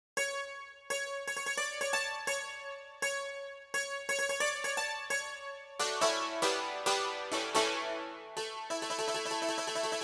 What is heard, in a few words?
Dulcimer; Quidam